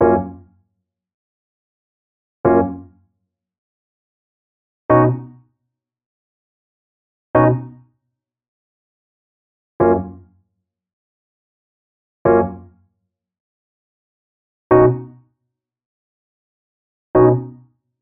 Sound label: delay echoes triplet dry; aas; objeq delay